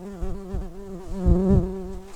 Pascuorum workers, bumblebees

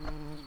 bumblebees, Pascuorum queens

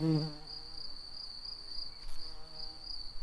bumblebees, Pascuorum queens

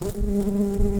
Humilis workers, bumblebees

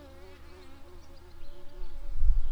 Recording, bumblebees, Sylvarum workers

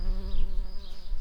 bumblebees, Hypnorum workers

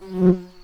bumblebees, Hypnorum workers

